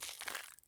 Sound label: walk asphalt; Escape from Tarkov